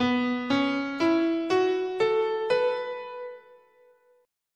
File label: B Suspended Pentatonic, scale